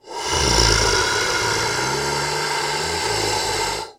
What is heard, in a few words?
Monster Animal Groan long